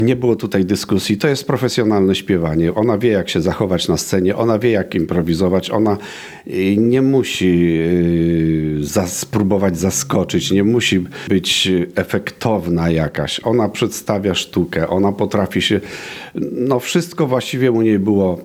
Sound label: Jazz